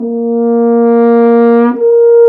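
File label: French horn intervals; F.Horn FX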